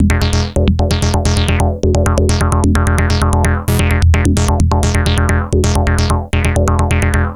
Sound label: Analoop, Industrial Analog